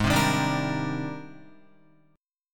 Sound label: Ab Major Sharp 11th Ab down Guitar; strummed